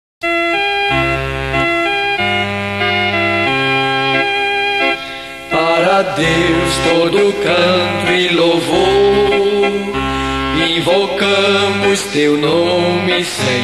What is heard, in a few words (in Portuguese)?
para deus todo canto e louvor; oficio divino cebs